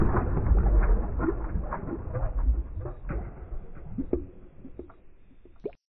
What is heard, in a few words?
Bloob; fa battlemaps